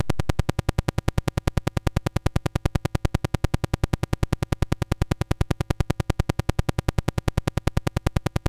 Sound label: Chopper, MOS6581R4